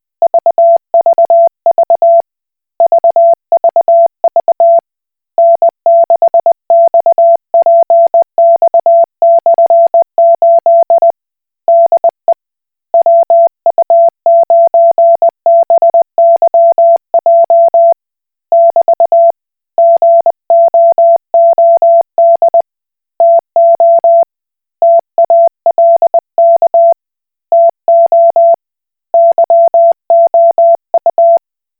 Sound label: Koch Morse